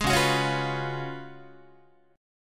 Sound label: Db 11th, Guitar; strummed; tuning=4A,4E,5A,5D,5G#,6C#; 11,9,9,11,9,10